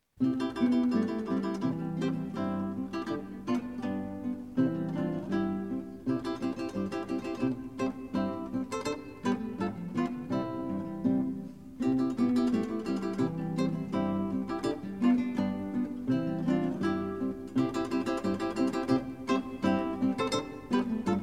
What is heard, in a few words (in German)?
Gitarren Bayrischer; Karl Heinrich Waggerl